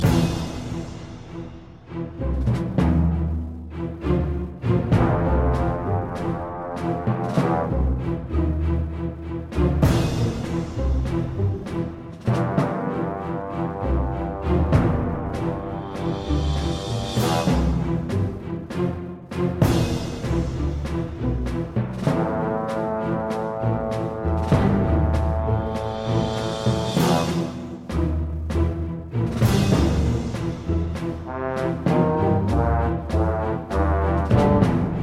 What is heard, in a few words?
Space Small Battle; Spore